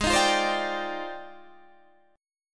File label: F Minor 13th, G sharp